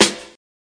snare

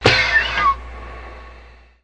factory high air, Factory Percussion

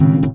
voxy two tone hit

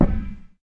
yogaball floor, Big Rubber Yoga Ball

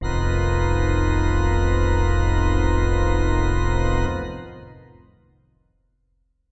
Heavy Pipes F, Heavy Pipe Organ; SYNTH KEYS

